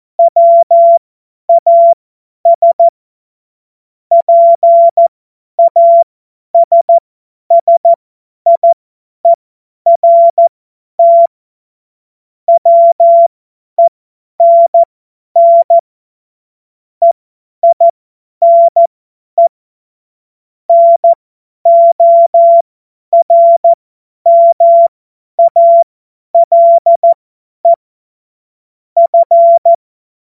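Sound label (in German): Witz, cw morsen; Morsekurs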